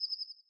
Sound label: Night Cicada Fade; Zelda; OOT